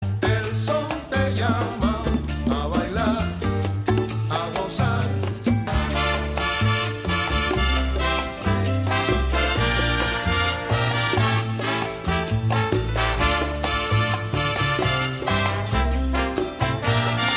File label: afro cuban